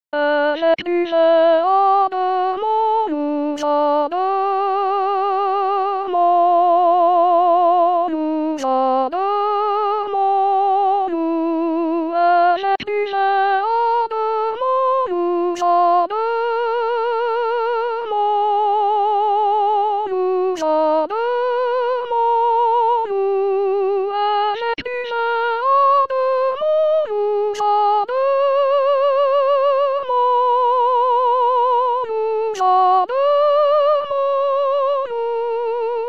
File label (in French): soprane; avec du vin